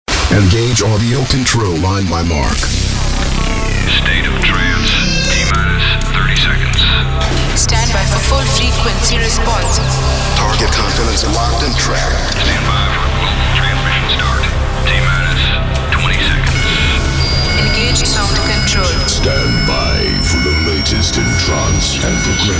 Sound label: Trance Intro